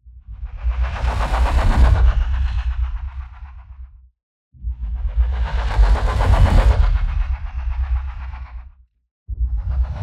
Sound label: Just Whoosh; SoundBits